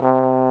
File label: BONE, Trombone; Solo Brass